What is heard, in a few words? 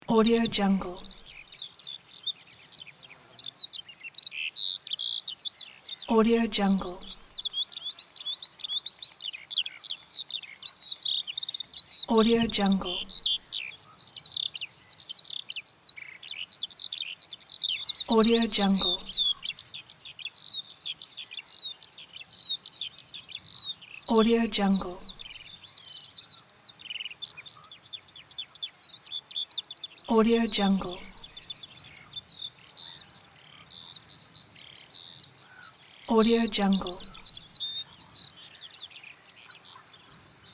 nature summer ambience